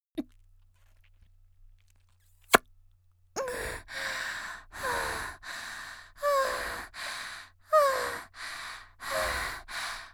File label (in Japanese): ゴックン後、口を離して「んっ」「荒い息」, 元気な少女